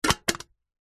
medium small sized metal stockpot cooking pot empty lid place on; household; zapsplat